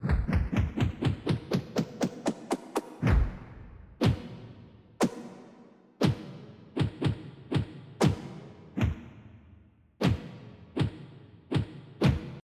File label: punch; Populous Demo